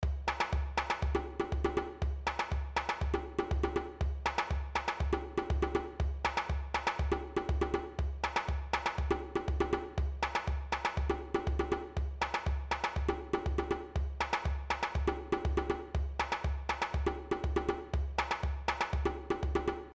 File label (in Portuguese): timba